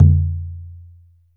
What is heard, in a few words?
MALAY DRUM; Spectrasonics - Heart of Asia CD2